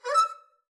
arco noise high short, double bass; dsmolken; noises

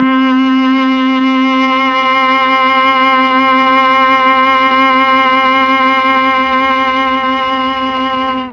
C3 viola, mellotron

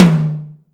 drum tom hi hard

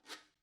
guiro short; Idiophones; Struck Idiophones

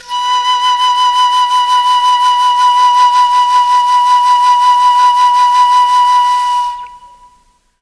B3 flute, mellotron

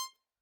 Hohner Special20 F normal release C5, harmonica; Aerophones; Releases; Free Aerophones

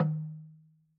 Log Drum Hi, Slit Drum; Idiophones; Struck Idiophones

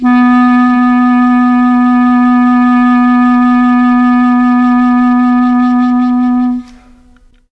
B2 clarinet, mellotron

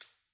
Cabasa Hit; Idiophones; Struck Idiophones

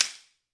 slapstick; Idiophones; Struck Idiophones

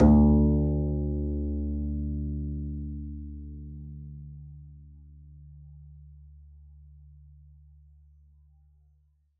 pizz d2, double bass; dsmolken

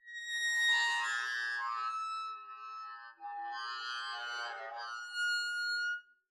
arco noise harmonics, double bass; dsmolken; noises

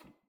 Harpsichord Rel Main D3; Chordophones; Releases; Harpsichord, Unk

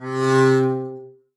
arco c3 ff down, double bass; dsmolken

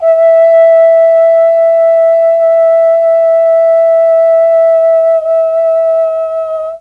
E4 TROMBONE, mellotron; CHMB TRMBONE

